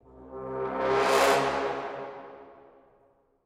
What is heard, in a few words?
low brass flutter; soundscrate